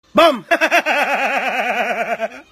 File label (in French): bam rire